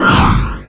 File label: Hit; Marisa Kirisame